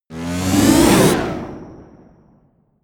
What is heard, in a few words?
promo pad